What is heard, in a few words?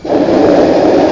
inflate, balloon